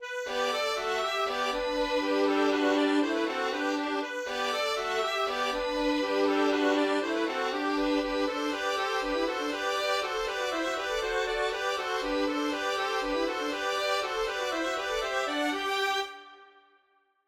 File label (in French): polka, 3 voix